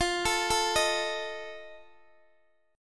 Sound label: F 7th F down Guitar Open D